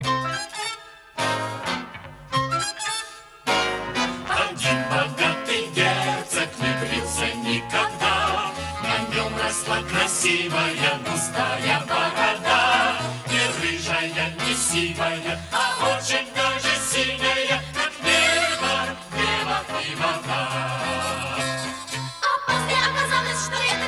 choir; blue beard

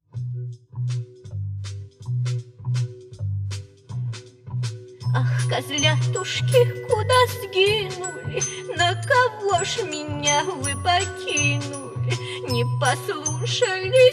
goat song; 7 kids

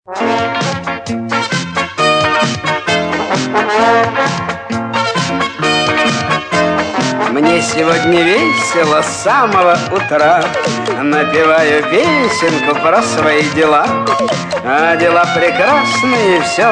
can song; kot Leopold

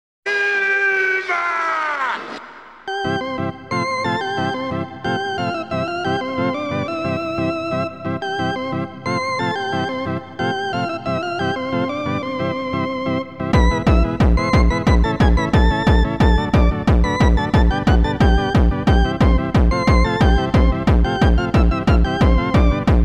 theme techno; Flintstones

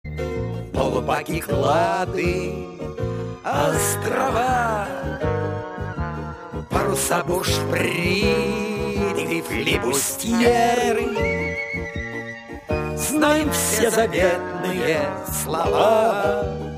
marine song; kapitan Vrungel

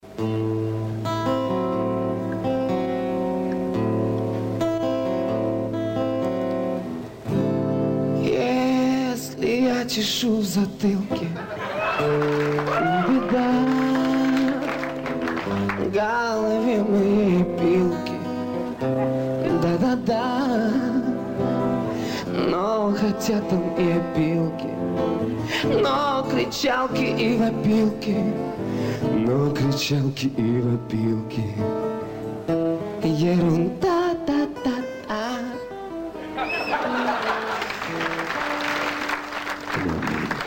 acoustic; Vinni-Puh

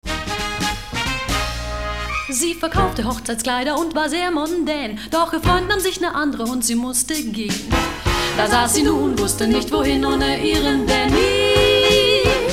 theme deutsch; nanny

